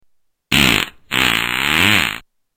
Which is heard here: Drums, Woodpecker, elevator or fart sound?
fart sound